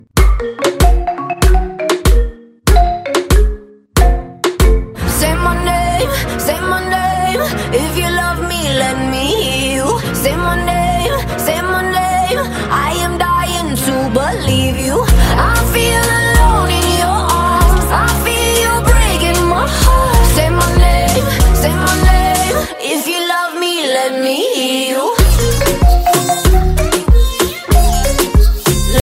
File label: sweet romantic english ringtone